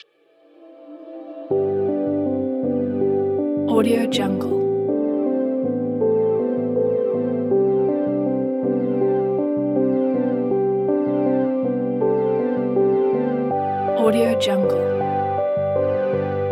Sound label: motivational ocean